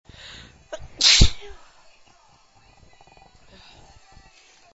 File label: messy, female